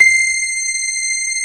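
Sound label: grand piano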